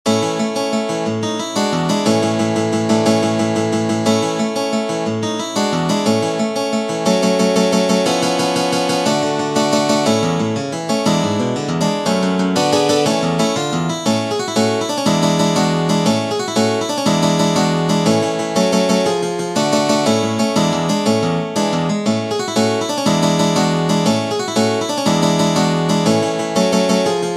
MARCH, guitar

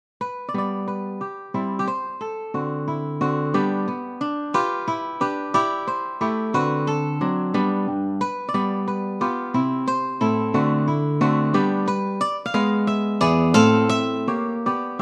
Prelude, guitar

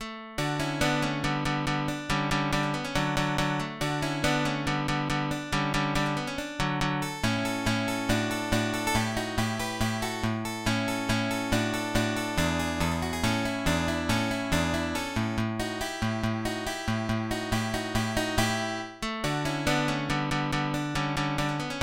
Allegro spiritoso, guitar; 6 rondos

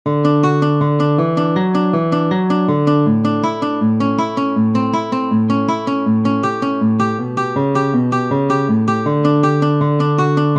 Allegro, guitar

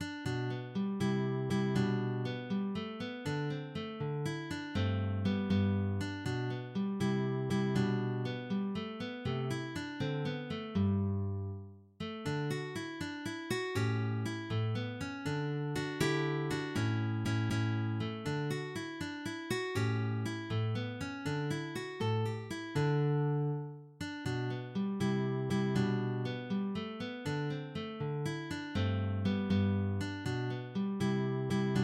contradanzas; Ferdinando Carulli

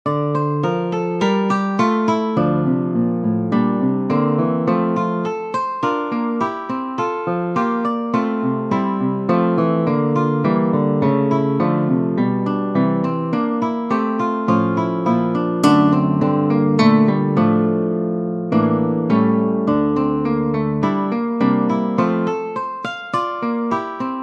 Prelude, guitar; francisco tarrega

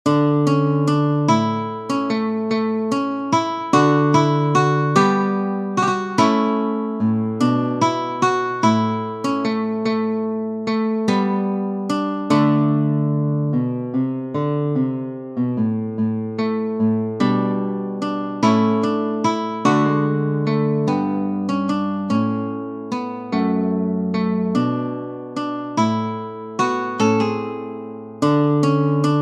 norwegian song

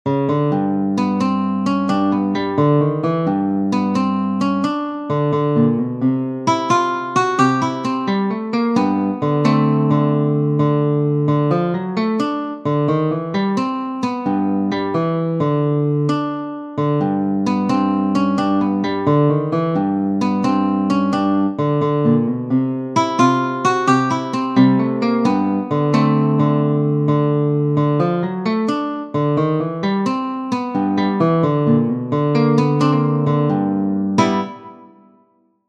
traffic jam blues

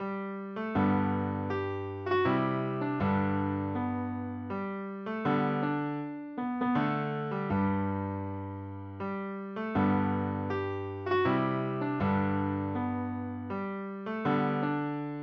guitar

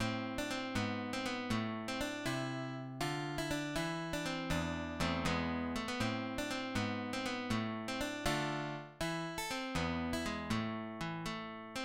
Andantino in A minor, guitar

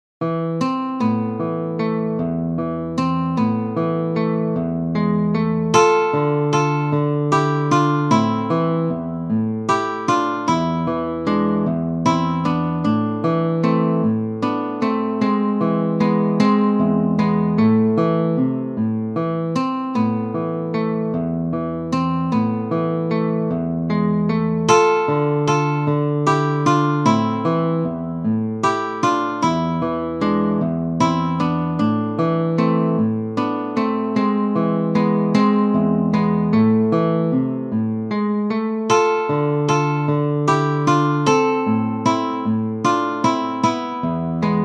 Russian Vals

